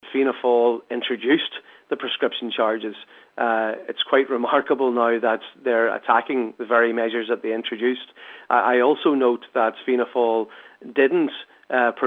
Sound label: pad